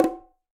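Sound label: Bongo Low Flam; Bongo B L Flam 1F; Latin Percussion; Drums; Drum Hits; Ableton; Factory Packs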